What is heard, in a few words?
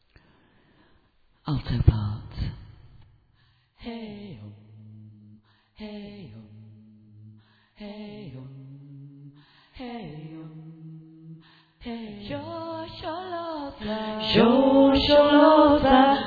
Alto; manchester